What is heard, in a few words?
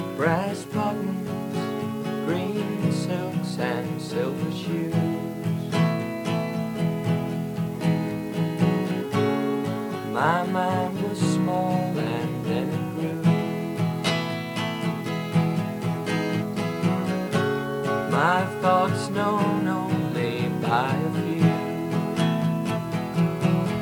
Brass